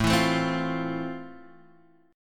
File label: F Major 7th A